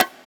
Conga; Equipped Music; Loungin House

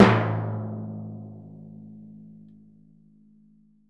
Synclavier Percussion A#; ILIO; Synclavier Percussion 2; World & Orchestral

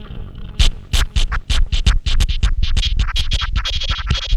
Fossil Fuel, Machinery; Sonic Foundry (Sony Creative Software); Myths of Technology

